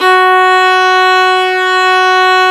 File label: VIOLIN F#3; Sound & Vision; Gigapack I CD 2; Violins